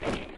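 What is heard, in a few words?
shuffle, footsteps, hard surface, jump up; elite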